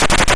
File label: YM Perc, DRUMS; ChipShop Deluxe; ChipShop Hits